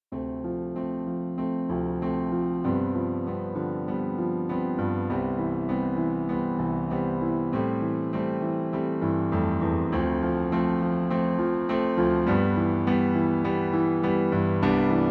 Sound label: All White Notes Backing Track